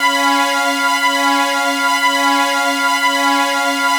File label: Pad, Trance